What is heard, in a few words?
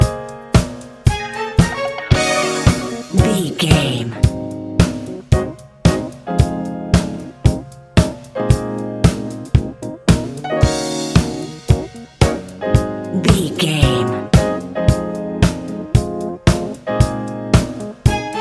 retro disco, A fast paced piece of electronic dance music